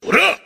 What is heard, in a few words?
fast punch